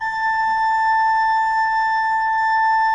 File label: A4, Cathedral Organ, ROHRFLOETE; Propeller Island